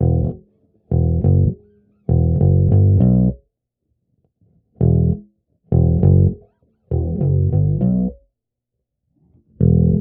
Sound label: bass; Phonky